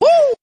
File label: "woo", male